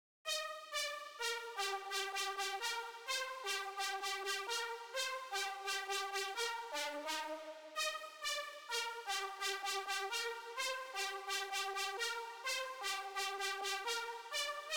128 BPM C Min Lead; House; Loop Stems; Cymatics; Breakdown Loops; Golden Hour

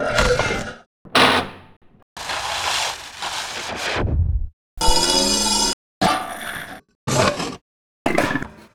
percussive noise mix, Cartoon Film Effects

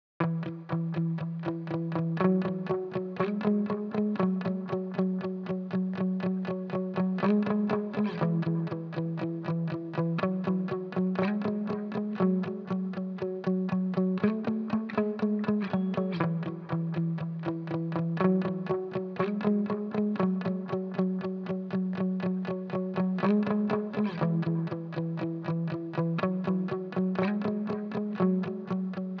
120 BPM E Maj Muted Guitar; Cymatics; Miami Guitar Pack - Pt 2; Losing

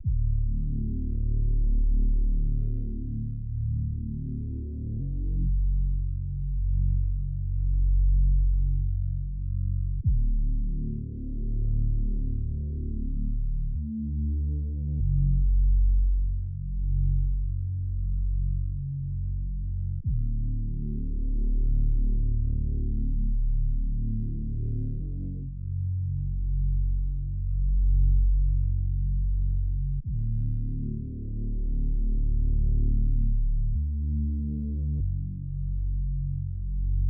96 BPM F# Min Bass; Melody; RnB; Loop Stems; Cymatics; 2022 Melody Collection - RnB Pt 1; Break Away

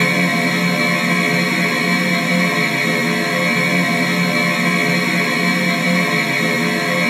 Texture Loop, Techno

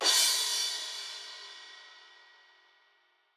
Crash Energy; percussion; Cymatics - Infinity Beta Pack 2.0; Zeus Percussion Collection

